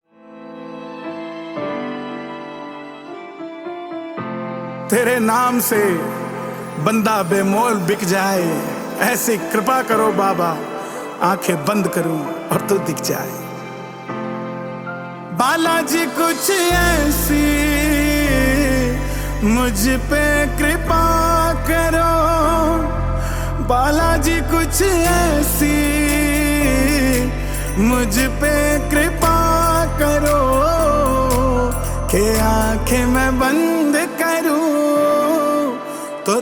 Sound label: Instrumental; Balaji